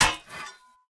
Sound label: 2handed sword strike muted; Rust